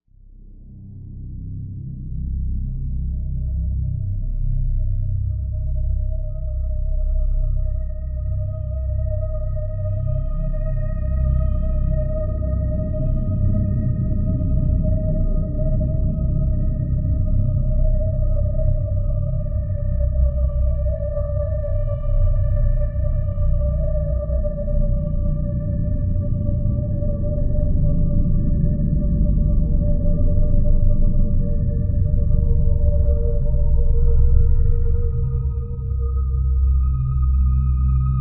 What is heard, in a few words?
Horror Scene, Novation UltraNova; Factory Patches